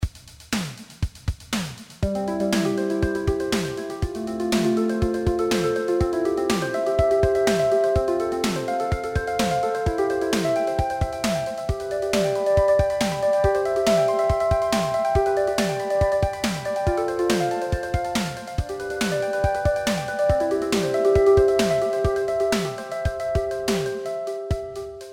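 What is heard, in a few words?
Accelerando and Rallentando, Pianoteq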